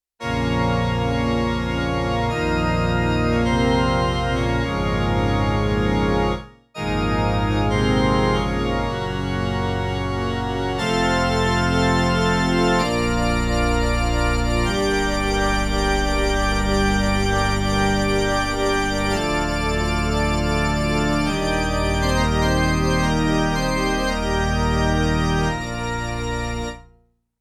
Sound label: C Organ, Yamaha TX16W; Orchestral Performance 2; St Moritz C Organ